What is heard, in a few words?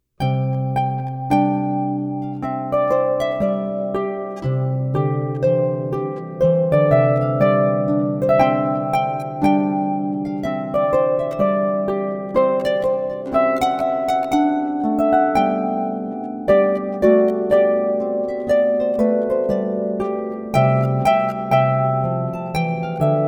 Trumpet Tune; Purcell